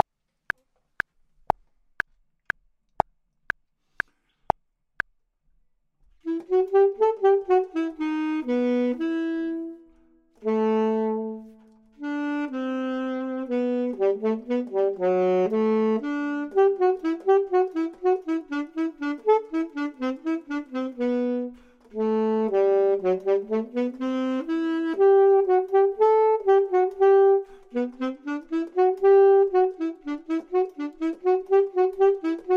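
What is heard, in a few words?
bottom, Saxophone and Clarinet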